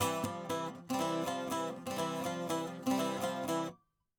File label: saz